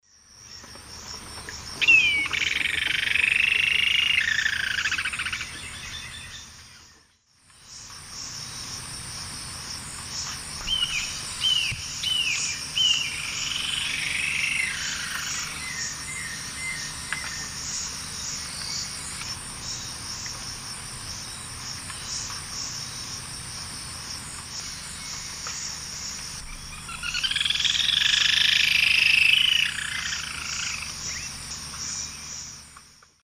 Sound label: Galbalcyrhynchus leucotis, group songs and calls; S. America; Eastern Lowlands